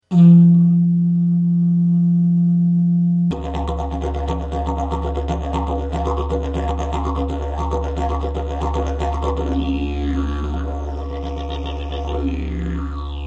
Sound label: didgeridoo